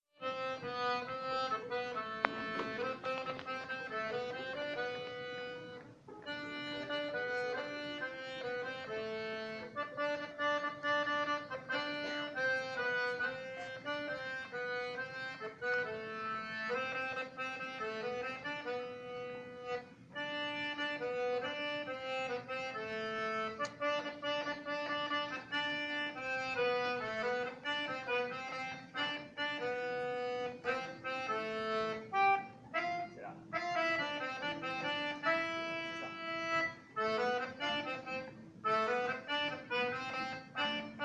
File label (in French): Voix 2 clarintettes; Musique d-ensemble